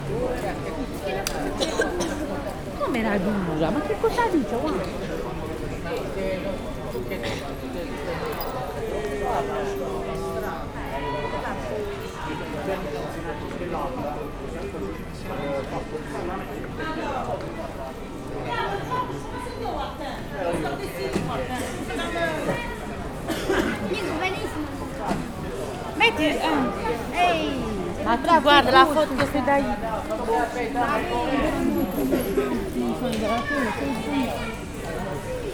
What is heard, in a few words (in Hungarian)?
besötétedett esti város